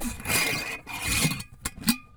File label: Metal Lid; BB Retail Therapy Sample Pack